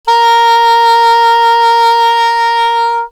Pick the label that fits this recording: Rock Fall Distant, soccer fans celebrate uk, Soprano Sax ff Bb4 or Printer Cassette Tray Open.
Soprano Sax ff Bb4